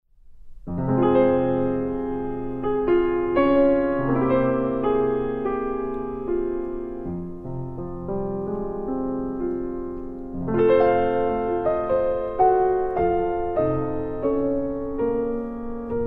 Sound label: Piano Quintet